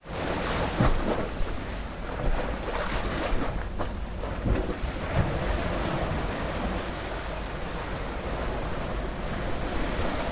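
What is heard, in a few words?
sea sound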